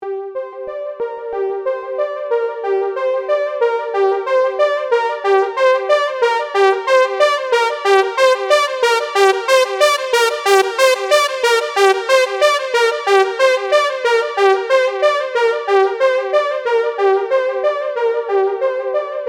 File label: analog lead; bluewave